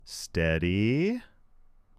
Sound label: suspense